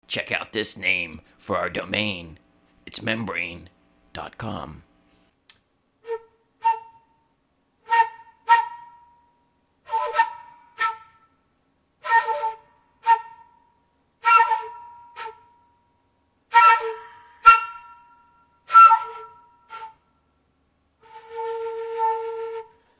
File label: flute